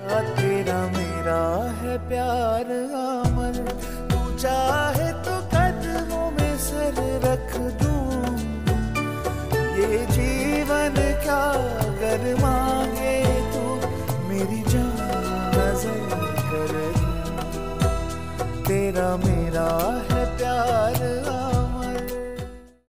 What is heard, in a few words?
Song Ringtone